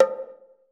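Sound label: conga, high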